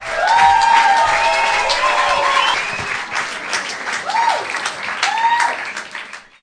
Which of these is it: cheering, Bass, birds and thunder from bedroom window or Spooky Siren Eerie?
cheering